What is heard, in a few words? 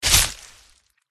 roha attack contact up